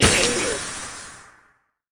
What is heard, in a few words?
Monster Death Gas